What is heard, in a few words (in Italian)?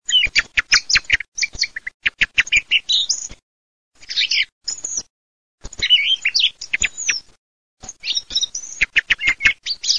TORDO